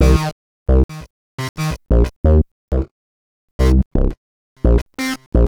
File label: Acid Bass